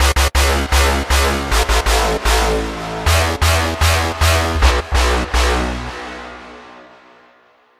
GROWL

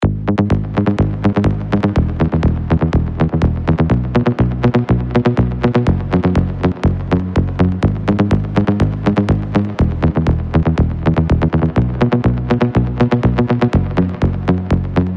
psybass

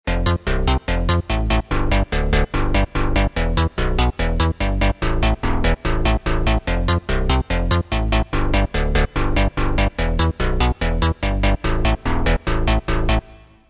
Bass Oldschool

